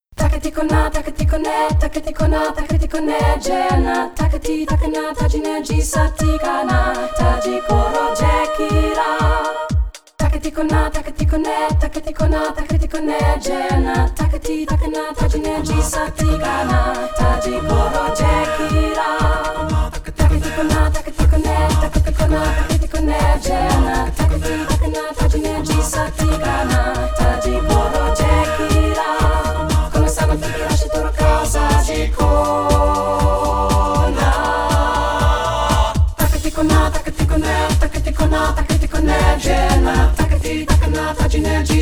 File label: Gallic Chant